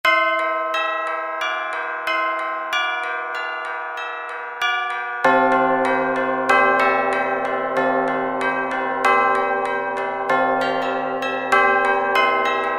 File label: Tubula Bells, Roland SR-JV80-02 Orchestral